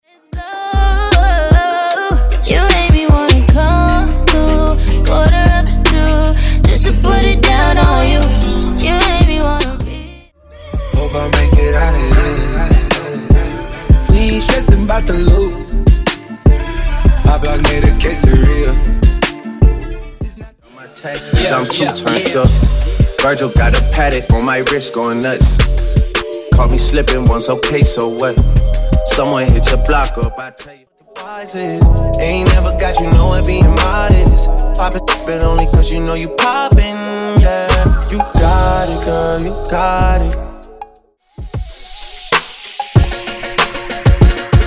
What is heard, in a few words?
Hip Hop And Rnb Megamix